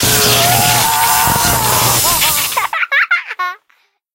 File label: Bzzt plus giggling; running man; genki